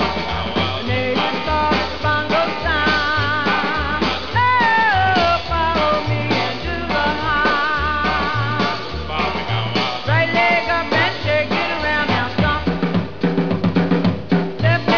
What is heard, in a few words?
bongo stomp